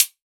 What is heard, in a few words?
hat, Closed Hat; Drum One Shots; SD MIDNIGHT Sample Pack; Slate